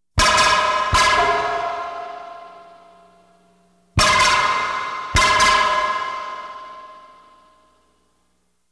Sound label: Science Fiction effects, Lasers, Light beams